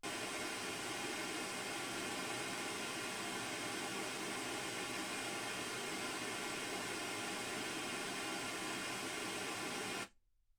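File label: white gaussian noise 48kHz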